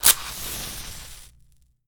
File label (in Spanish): Encender Fuego